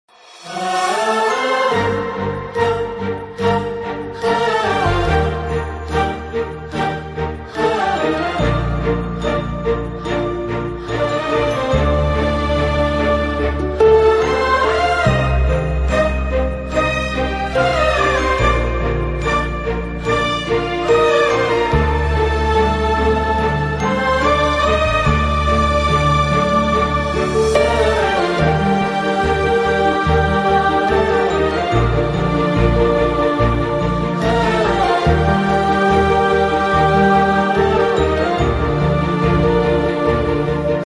BG Humming

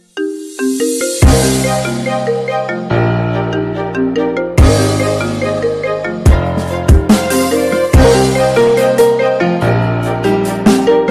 Latest Iphone Ringtone

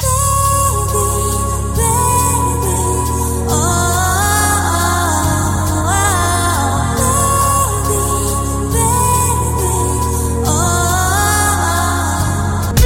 Instrumental

